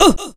Ooh

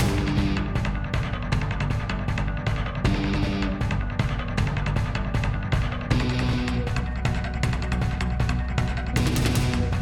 Tornado Combat MIXED; Ben 10

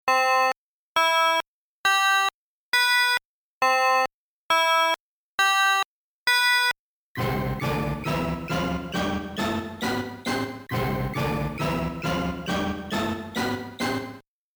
Arcade Speaker Test; Konami

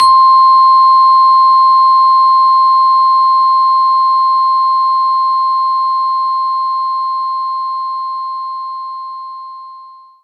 Vibraphone C6; knights try

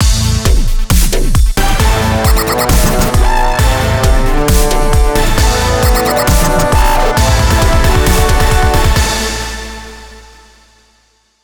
Win Win Win; Ghost Blade

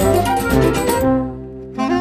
mus cage forcefield; jungle world

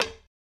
Gold Dig; Blockman Go; Android

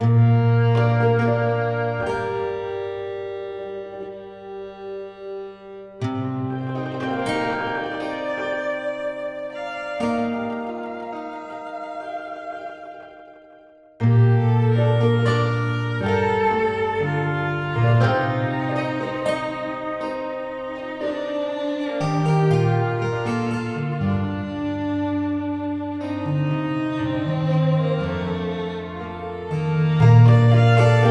Sailing; windward android linux macos windows gamerip 2015

